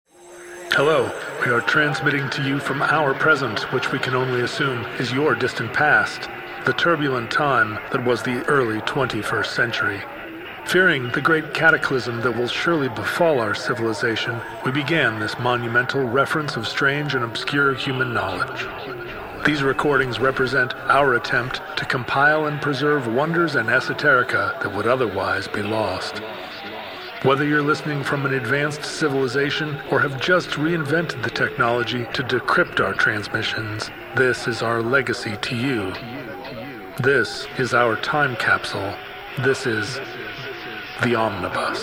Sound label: doorbells